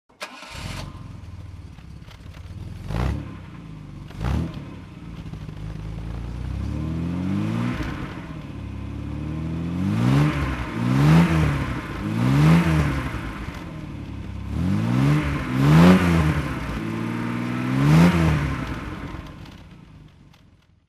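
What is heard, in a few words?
MGC GT automatic throttle, engine sound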